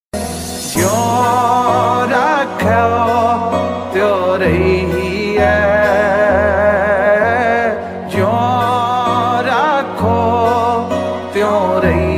ringtone gurbani waheguru; baba nanak

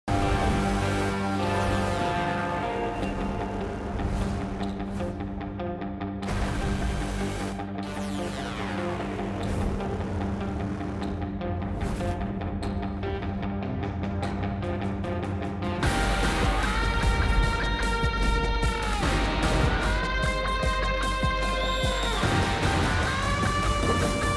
bgm music for mobile ringtone; a1 express; lavanya thripati

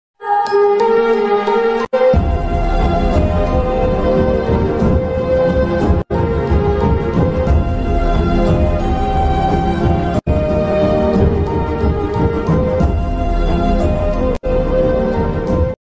sad romantic ringtone south bgm; atithi devo bhava; nuveksha

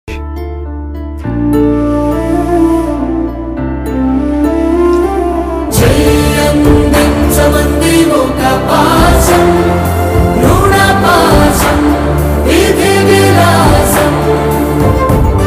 telugu movie bgm; bimbisara; nandamurikalyanram

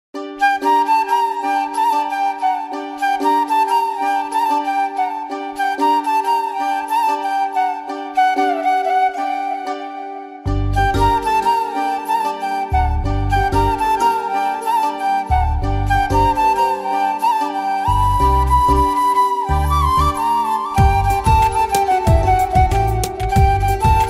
bgm ringtone, flute

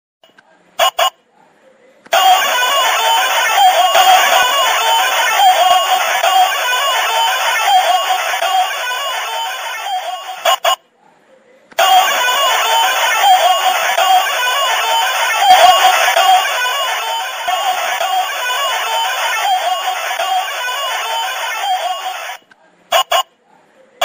toy ringtone funny ringtone phone ringtone; barbie phone

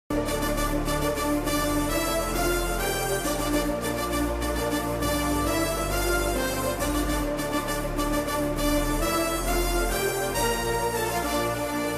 interval bgm ringtone; mahasamudram

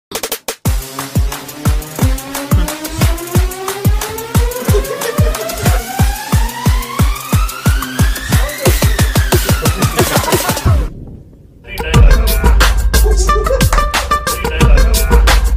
agnathavasi bgm; msboys

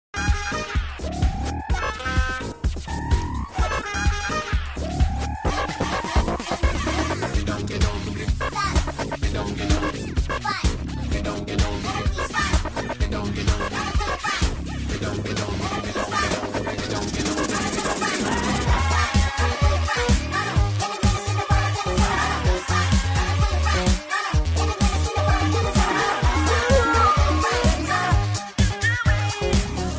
funny bgm ringtone; raja raja chora

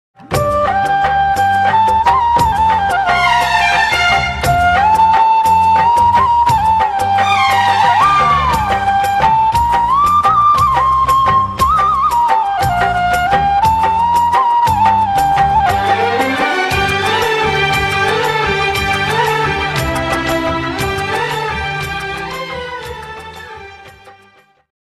love ringtone instrumental ringtone flute tune

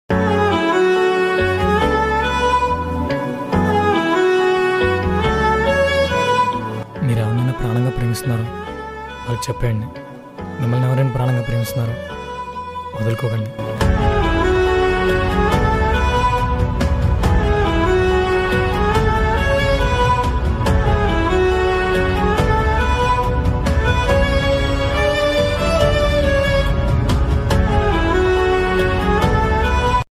sad bgm; software developer short film

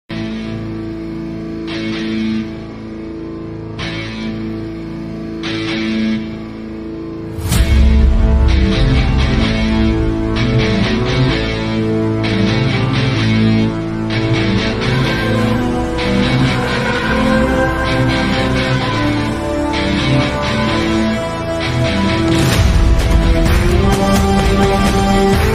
entry ringtone, theme music; salar; parbhas

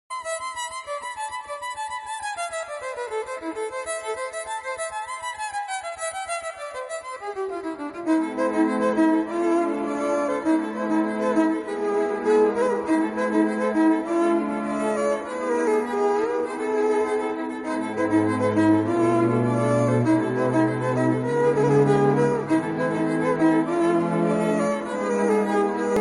violin ringtone; theme violinist; kadhalar dhinam

